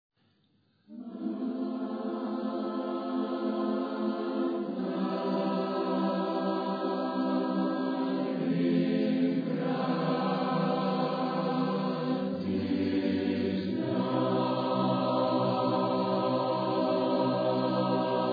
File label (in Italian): Piccolo corale popolare